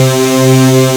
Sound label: Synth Spectrum; Mo' Phatt; Digital Sound Factory Mo' Phatt